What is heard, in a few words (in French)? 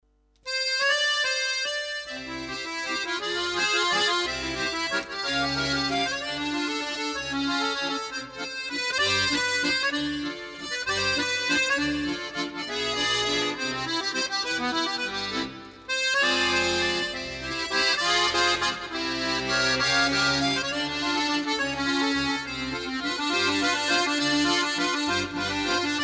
trompette accordeon